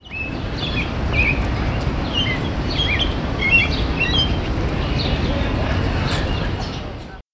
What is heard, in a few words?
Turdus migratorius; C Park NY; Silveira